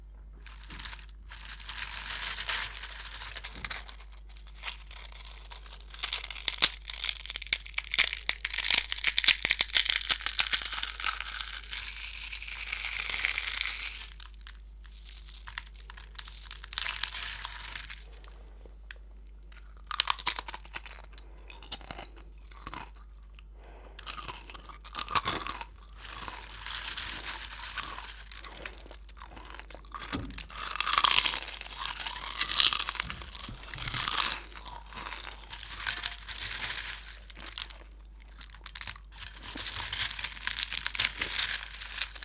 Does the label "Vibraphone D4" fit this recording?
No